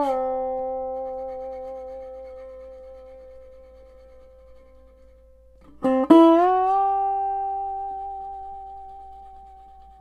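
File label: Country Dobro, Breakdown; Digidesign; Pro Tools Essential Loops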